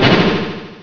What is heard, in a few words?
New Level; PushPush 1.0